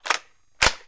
on off, Switch FX; Masterbits

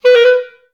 B3, Advanced Orchestra; Best Service

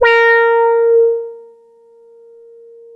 A#5, Boom da Bass; E-MU Producer Series Vol. 6 – Analogia Project (CD 1)

